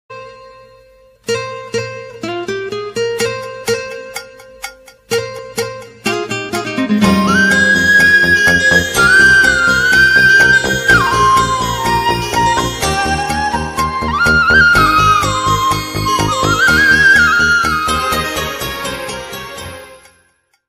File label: kannada ringtone bgm; huchcha movie